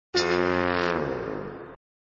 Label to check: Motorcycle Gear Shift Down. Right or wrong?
Wrong